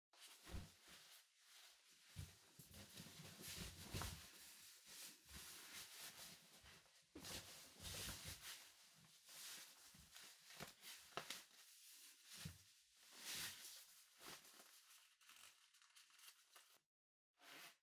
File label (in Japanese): ズボンを履く; 3DIO; 色々な動作